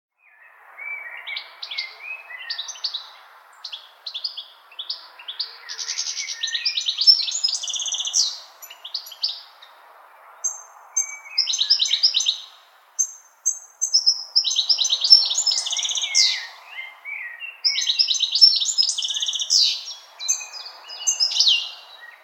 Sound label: beautiful birds singing ringtone